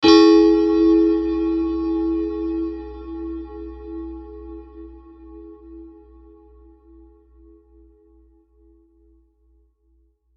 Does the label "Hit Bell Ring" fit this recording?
Yes